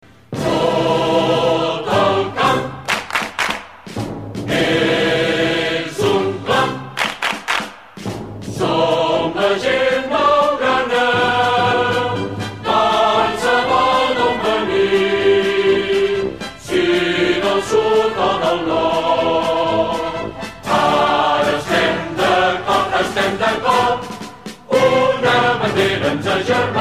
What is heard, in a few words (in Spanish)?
himno de barcelona catalan